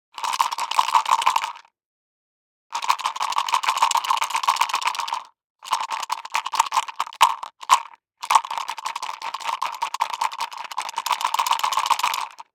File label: DICE in Plastic Cup, Shake, 4 Four Dice; Samples; ArticulatedSounds; DICE Sfx Party (Extended)